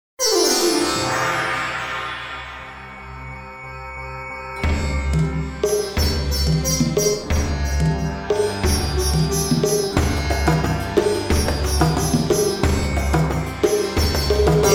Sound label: Happy Birthday to you Indian Version; Karaoke; Singing Bell